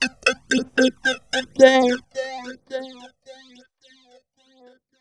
beat 4 fx, funky vocals; USB Soundscan vol.18; AKAI; TB BEAT

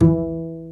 PITZ STRINGS

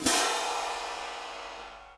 Cym short p, Cymbals, Orchestra; 90 s Sample CDs; PowerFX - Downtown Orchestra

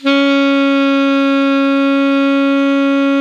ALTO PP C#3, SAX; Greytsounds - Philip Wolfe David Crozier's Saxophones

